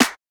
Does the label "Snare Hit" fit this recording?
Yes